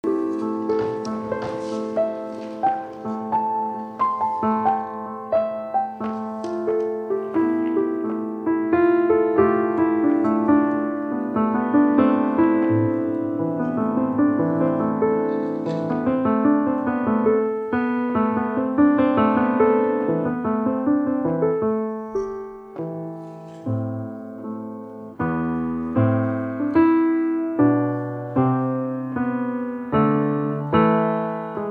Adagio For Strings

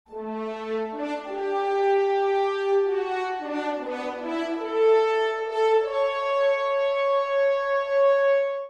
Fanfare; Star Trek; Enterprise

